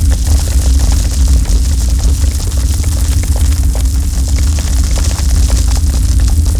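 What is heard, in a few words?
spell destruction travel loop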